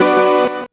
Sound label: Key Stab OS; Sonic Foundry; Rondo Brothers Trip-Hop Loops & Samples